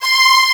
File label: ensemble strings C5; Star Trek JR Audio